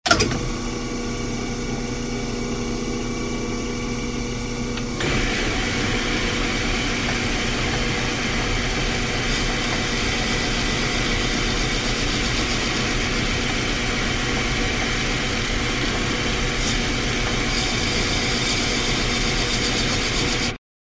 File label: Metal lathe; SOUND EFFECTS1